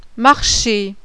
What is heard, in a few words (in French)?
marcher